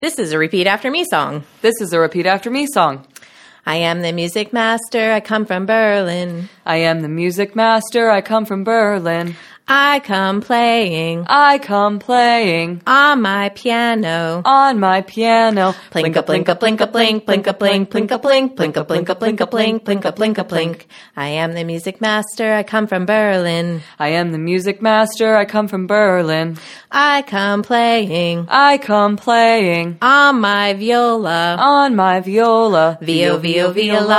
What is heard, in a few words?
Music